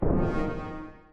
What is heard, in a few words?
Rebloom Drum; Musician